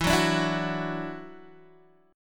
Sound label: E 7th Suspended 2nd, down, Guitar, DADGAD; E7sus2; strummed